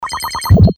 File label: Transatari Pellet; Best Of N2O Library; Breakers & Transitioners